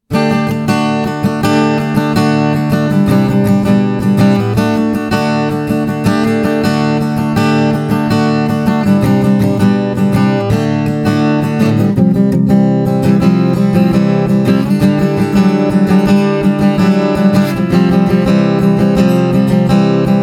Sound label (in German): Piezo und Mikrofon